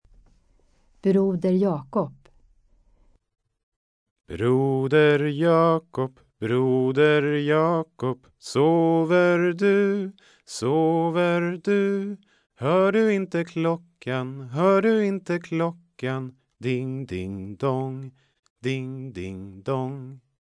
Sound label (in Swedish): Broder Jakob